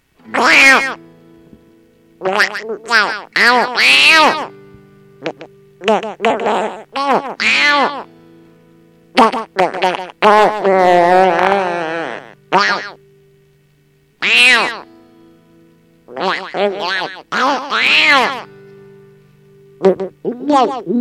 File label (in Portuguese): Peido song vinheta